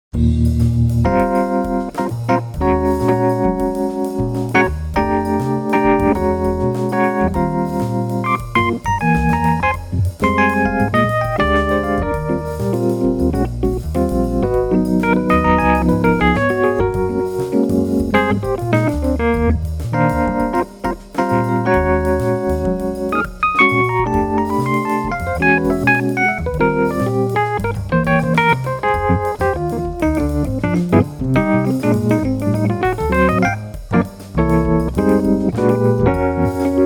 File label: EPiano